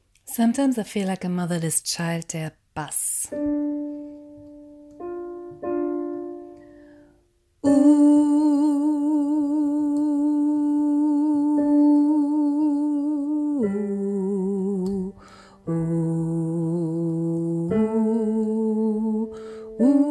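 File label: Sometimes I feel Bass, gospel choir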